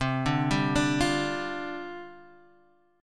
C Suspended 2nd Suspended 4th, down, Guitar, Half Step Down; Csus2sus4